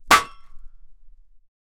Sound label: a shovel hits the ground; construction